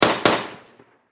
Warfare Machine Gun 7.92 Caliber MP44 StG44 German Double Shot Distant Perspective